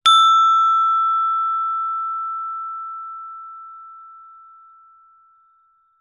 musical instrument gender gamelan single mallet hit high mid